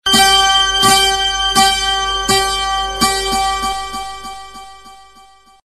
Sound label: electronics, ringtone